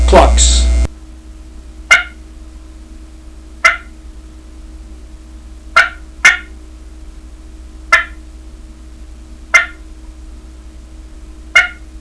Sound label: clucks, turkey; dixie